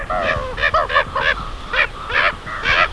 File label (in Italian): Gabbiano roseo